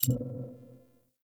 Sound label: Lock Dial; Warframe; Sacrifice quest; Minigame